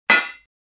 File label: Metal object falling on hard ground